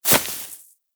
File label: rodent hop step rear grass, forest rodent footsteps; Warframe; Characters